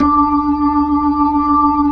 B-3 D 5, Jazz; Keyboards of The 60's and 70's

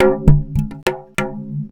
XYLOFOAM LOOP; Rarefaction - Poke in The Ear With A Sharp Stick

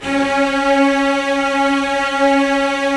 STR, Roland LA Composer; Roland - LA Composer vol. 4

